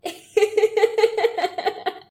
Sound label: giggles and laughter; SyrinScape